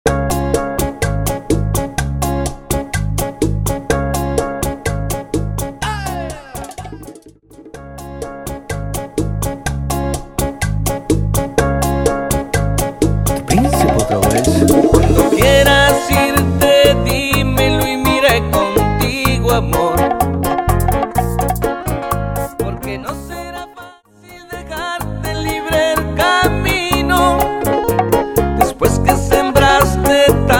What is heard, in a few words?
Bachata 125 bpm